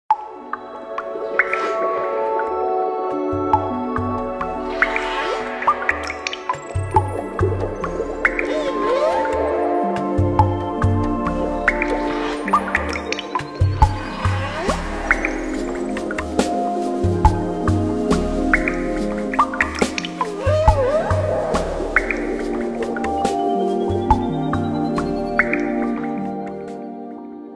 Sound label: Water clock alert; Nokia; Audio themes